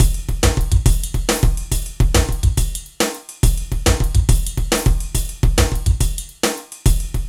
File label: ROCK, Funky; INIS